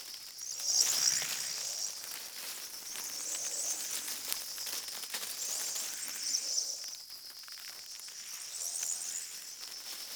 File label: Landscape Insect Loop, Stereo